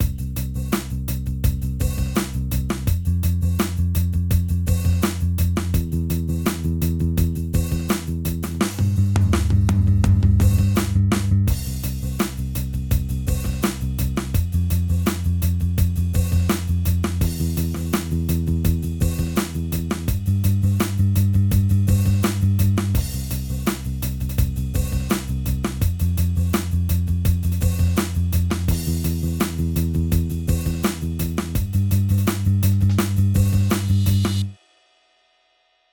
Drums and Bass dry

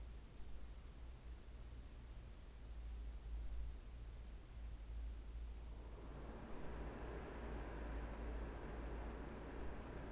heat kicks on

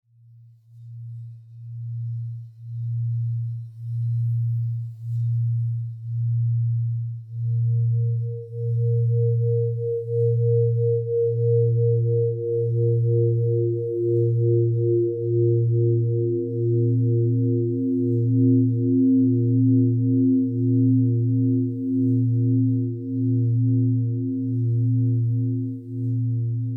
Distortion Test

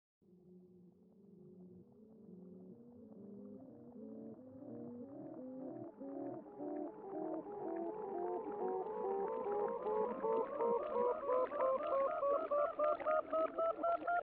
bubble down reversed 2 octaves

